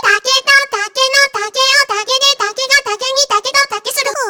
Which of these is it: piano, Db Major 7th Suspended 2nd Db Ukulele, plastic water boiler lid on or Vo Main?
Vo Main